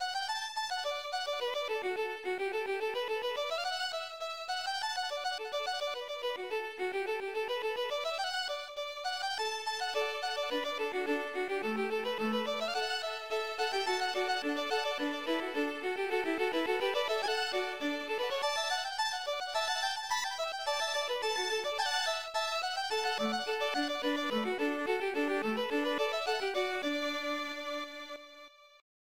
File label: Little Fiddle, music; Colonization